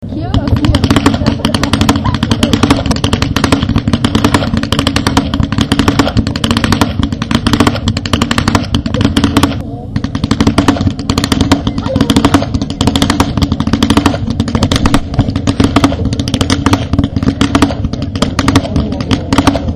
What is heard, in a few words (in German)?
schnalzen; feldkirchen